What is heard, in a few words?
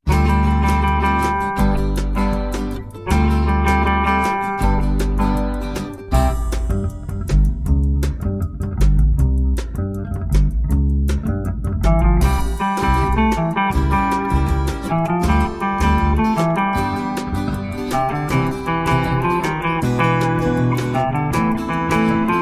bossa